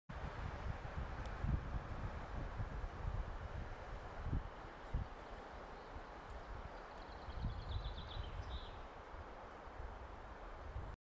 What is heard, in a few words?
Light breeze